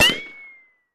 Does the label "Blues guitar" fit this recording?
No